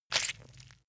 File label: leather impact mud soft squeeze